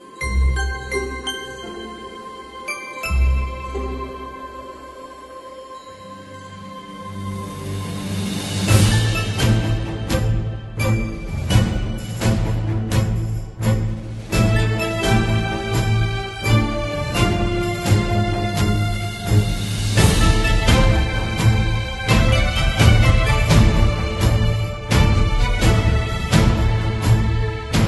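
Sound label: Mobile Ringtone Christmas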